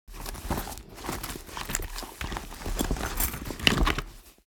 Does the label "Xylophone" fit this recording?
No